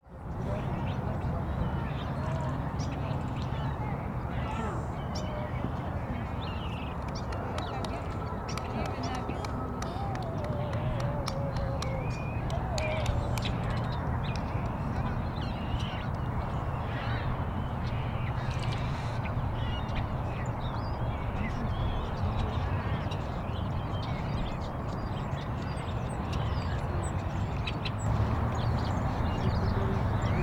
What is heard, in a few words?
park, ambience